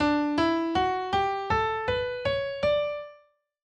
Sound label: Major Scale D